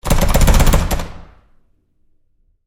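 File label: Door Bathroom Stall Handling close-up Rough Short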